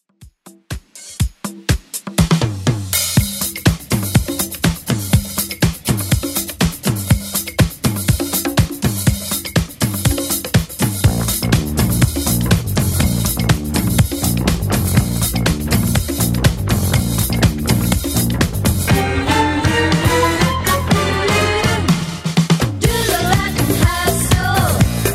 70s Redrum 122 bpm CLEAN

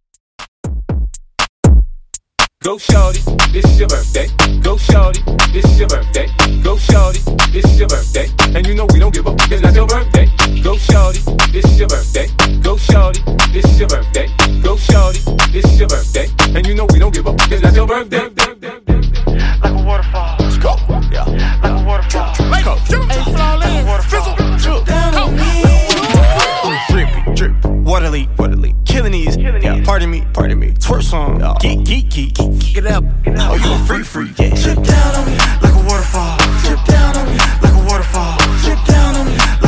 Breakz In Out 120Bpm

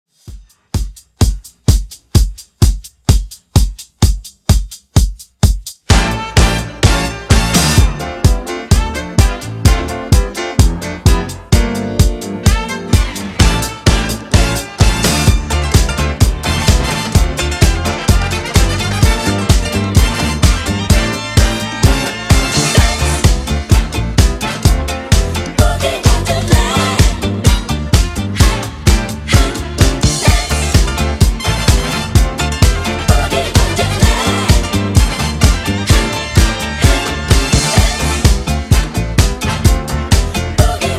130Bpm

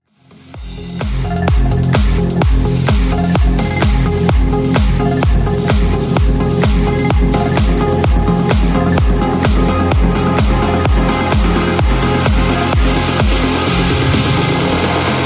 Hype 128 bpm DIRTY

